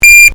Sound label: beep bus